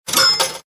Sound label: betting sound